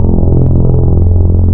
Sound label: FAIRLIGHT MOVE, wavetables, Classic Synths